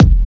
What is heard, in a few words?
Kick, Kickdrum; Equipped Music; Loungin House